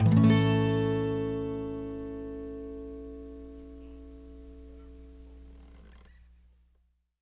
Acoustic Guitar Chord, A Maj; Instruments; Guitars; Cymatics; Light Free Sample Pack; Bonus Samples

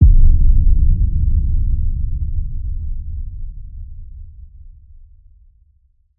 FX Deep Hit, Deep House; Ghosthack; Advent Calendar 2019

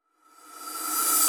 Reverse FX

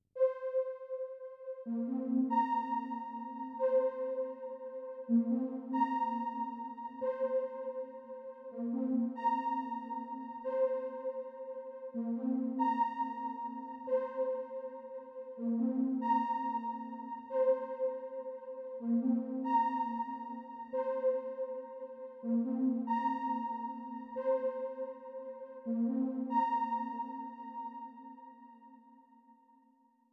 70 BPM A# Min Synth, RnB; Melodies; Desires

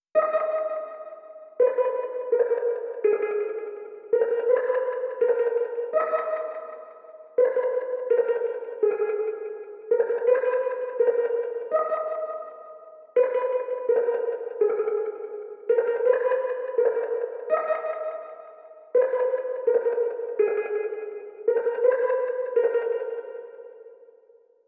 166 BPM G# Min Atmosphere; Melodies; Loop Stems; Cymatics; Goosebumps; Pharaoh Premium Drum Samples (BETA)

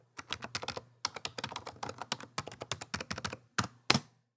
Typing, foley percussions; Dubstep; Ghosthack; Dubstep Sound Arsenal

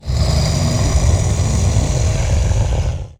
Creature Growl; Game Essentials

